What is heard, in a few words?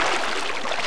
water wade; Gamestar